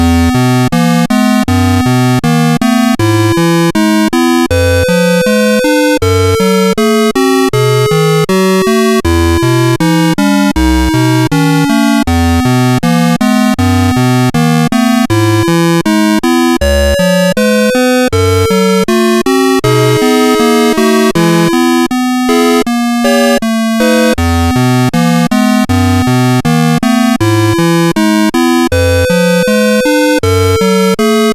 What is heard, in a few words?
Melody in F, CSG8580R5 sid